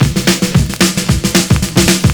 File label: 70s Breakbeats; USB Soundscan vol.46; AKAI